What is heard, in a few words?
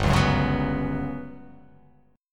G Major 7th Flat 5th